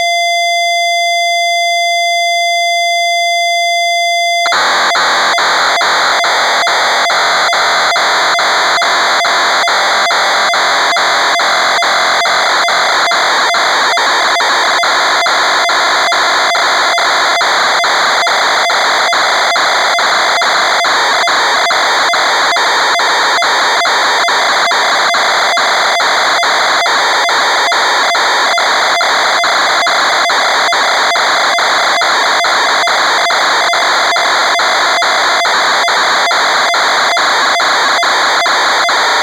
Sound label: MORSE